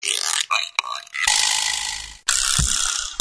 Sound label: SCRAPE